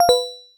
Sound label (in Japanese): ブザー; LcdDem SFX